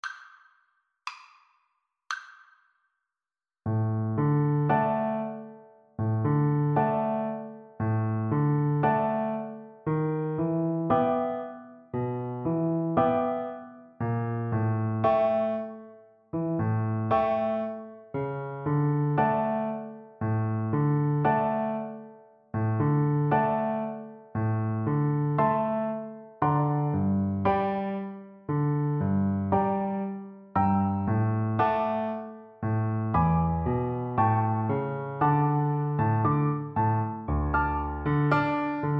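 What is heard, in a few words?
viola karaoke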